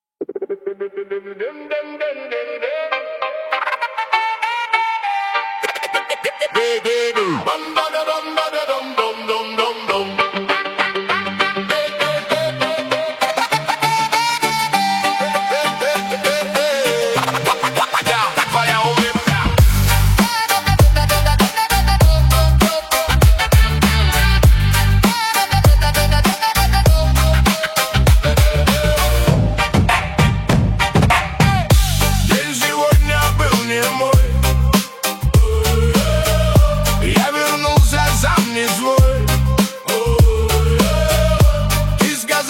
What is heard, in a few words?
night bass atmos